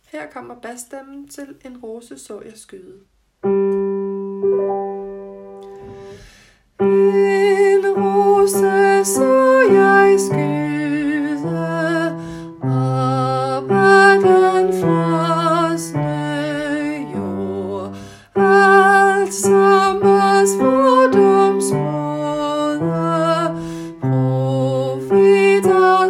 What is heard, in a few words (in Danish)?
bas